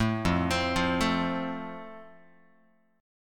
F Major, Guitar; arpeggiated; chord

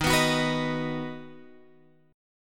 Eb Minor Sharp 5th, down, Guitar, Drop D

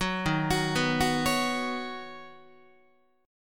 D sharp Minor 7th Sharp 5th, Guitar; arpeggiated; JGuitar

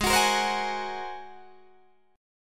G sharp 9th, Guitar, Open D

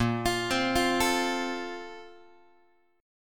Bb Major 7th Suspended 2nd, Guitar; x,6,8,10,9,8; 4A,4E,5A,5D,5G#,6C#; arpeggiated

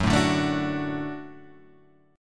D Major F sharp

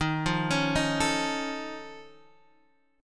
F Augmented 7th D sharp, chord

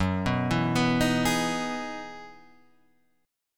E Major 9th F sharp; arpeggiated; JGuitar

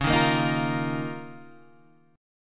Eb 7th Suspended 2nd Db

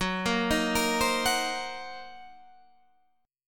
Fsharp 6th Flat 5th down, F#6b5 chord; arpeggiated

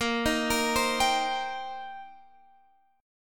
D sharp 6th, A sharp

